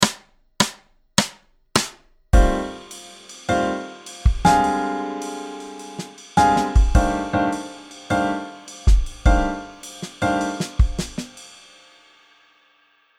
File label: Left hand jazz swing, piano